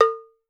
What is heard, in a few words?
large cow, cowbells; Voice Crystal - Steve Reid Definitive Percussion